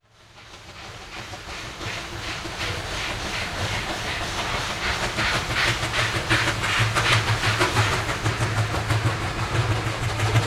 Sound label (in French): locomotives a vapeur; sonotheque